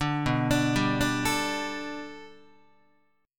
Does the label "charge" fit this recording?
No